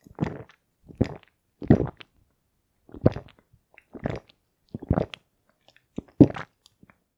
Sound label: pump; Lewd SFX